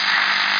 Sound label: BUZZ; PC Gamer IT CD 41; machines demo; Interfer